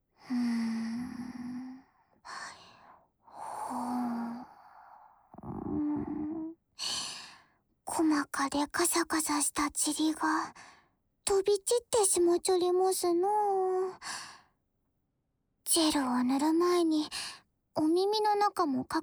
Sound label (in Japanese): 乳液を塗り込んでからの椋の木耳かき+綿梵天でで王道耳かき（右耳）